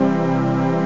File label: tranz maj; The Epic Collection 3; Epic Marketing; muzak samples